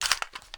sr25 mag in, weapons sniper; garrysmod

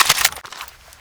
scar clip out; garrysmod

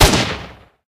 m4a1 unsil shoot; garrysmod

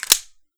mag shove, sig p228; weapons; garrysmod

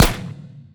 alyx gun fire; weapons; garrysmod

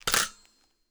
bipod up, weapons accessories; harris; garrysmod

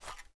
m3s90 get ammo; weapons; garrysmod; m3s90p

